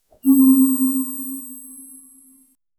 dark silent magic mist

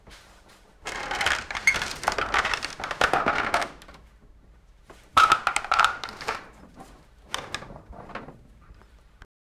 wodden panels lining up o

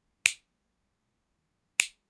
simple grabbing sound; human interaction

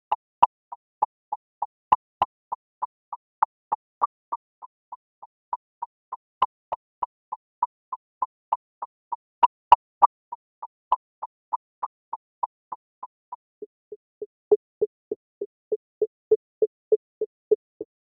bounce; physical

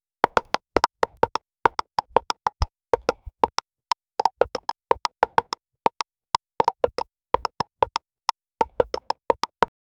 clean isolated sound of a, clip-clop

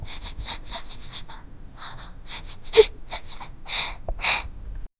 child sniffle

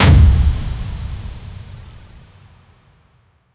heavy grab impact; object manipulation

